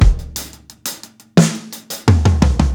87 HALF TIME 16THS, DRUMS; BASEMENT DRUMS VOL 2

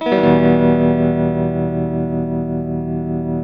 TELTMUMIN F, TELE CHORDS, Guitars; Spectrasonics; Hans Zimmer Guitars VOL-1

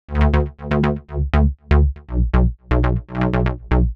120 Sad Story Bass; Zero-G; Soundclash Nu Dance Electro; Acidized Wavs; Combi Loops; 120bpm

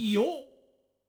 IOOH, Yamaha DD-65

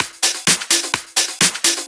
Discohouse Loop 128 BPM

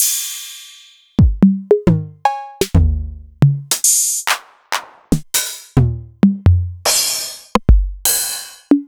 Novation Drumstation

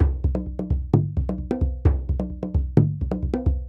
African Drum 130 bpm mix; African Drums; Quazibeat; African Secret

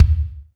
kicks

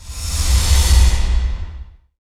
reverse; Techno Trance Essentials; FX & ATMO

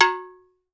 BRASS MAM, COWBELLS; Voice Crystal - Steve Reid Definitive Percussion

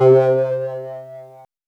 LEAD R; AMG - Vince Clarke Lucky Bastard

